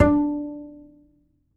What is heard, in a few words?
Upright Acoustic Bass; 1ST POSITION; Larry Seyer